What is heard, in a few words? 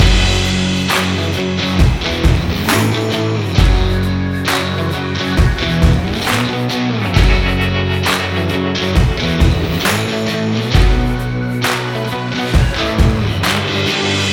music background looping